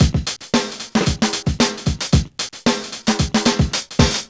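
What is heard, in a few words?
113 bpm, Funky loops; Zero-G; Funky Elements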